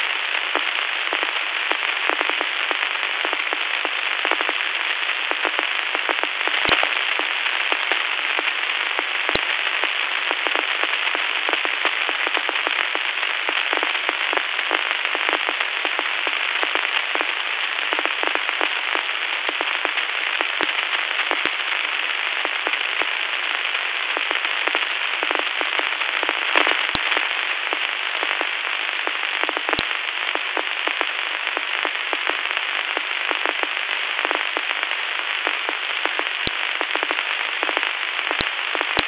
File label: radio noise loop